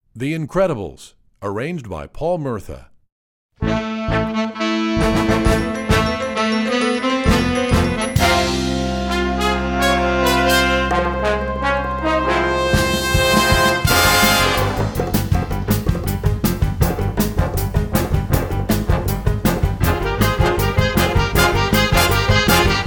jazz ensemble; the incredibles

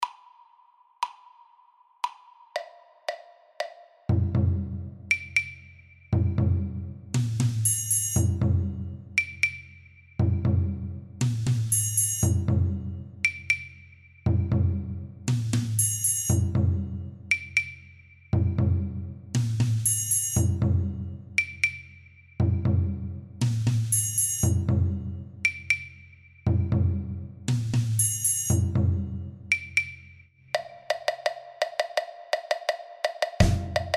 percussion accompaniment

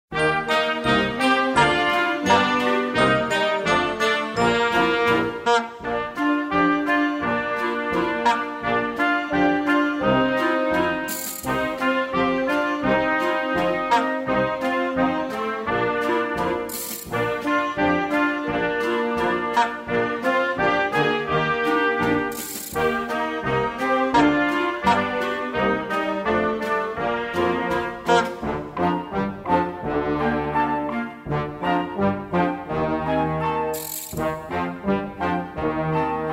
fast tempo; antique car parade

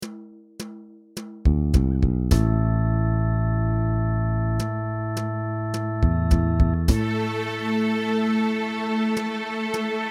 orchestra tuning track

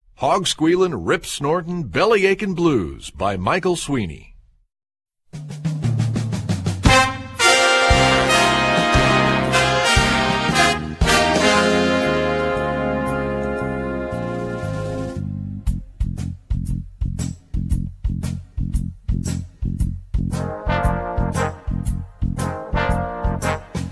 hog squealin blues

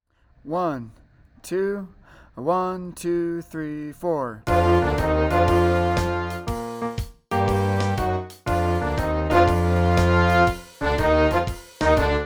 jazz band audition practice track

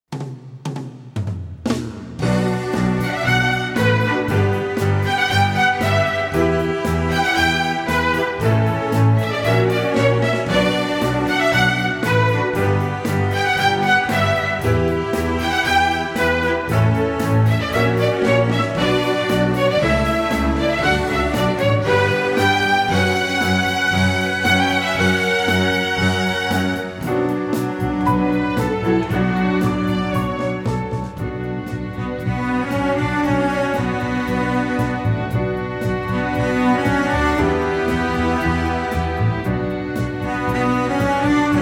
string orchestra

